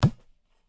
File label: tabla; bahia; Dirt-Samples